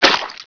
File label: Squishy; The Crow City of Angels